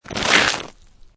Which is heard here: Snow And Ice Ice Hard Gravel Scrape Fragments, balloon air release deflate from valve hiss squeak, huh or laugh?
Snow And Ice Ice Hard Gravel Scrape Fragments